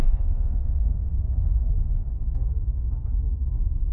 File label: explosion